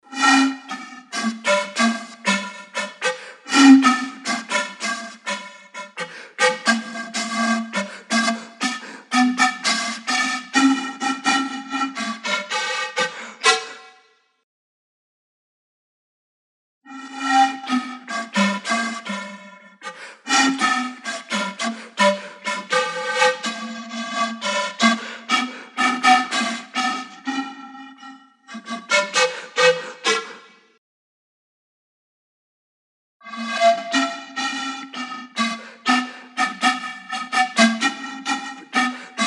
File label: ZANKA Sikus